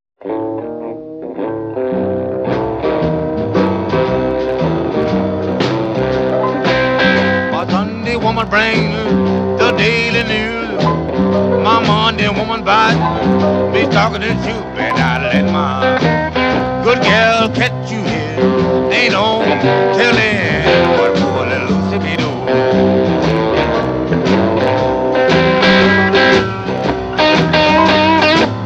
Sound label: Blues